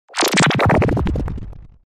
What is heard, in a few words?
Laser Heavy Lfe Phase